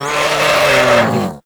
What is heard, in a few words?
Combat Vocal Death; Warframe; Catbrow